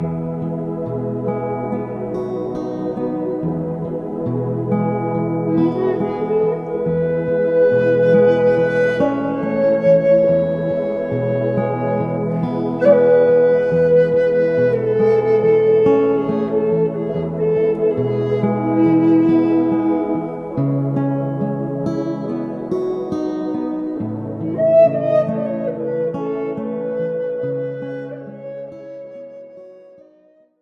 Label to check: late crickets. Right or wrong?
Wrong